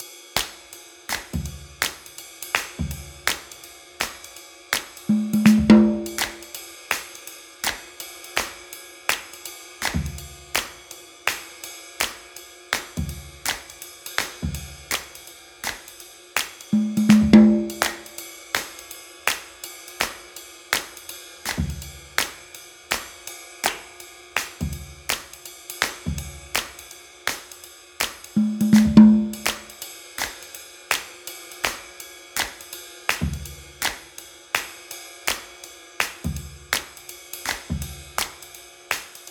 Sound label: cheerful drum comp; by burak sound bank; cheerful vol 12